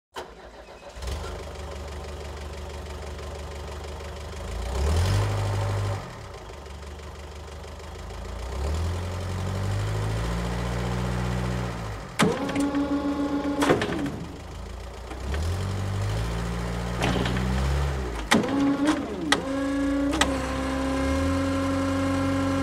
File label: Forklift